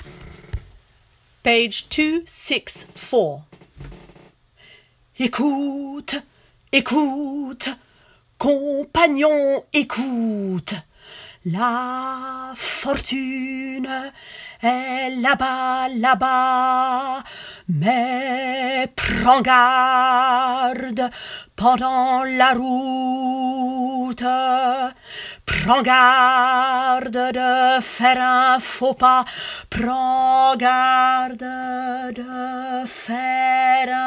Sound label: bas; Carmen